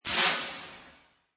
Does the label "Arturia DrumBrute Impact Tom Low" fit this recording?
No